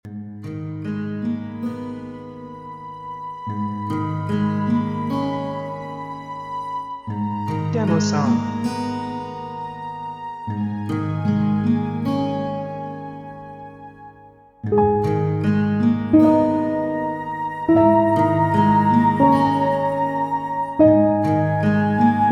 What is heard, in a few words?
Walking Alone Flute